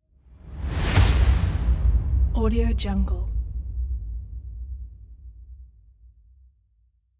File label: cinematic impact; moonaudioproduction